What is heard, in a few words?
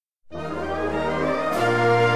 Polka